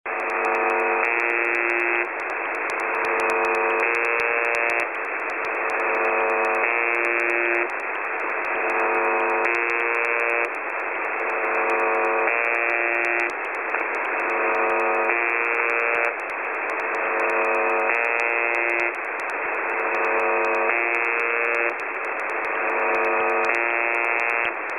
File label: BUZZ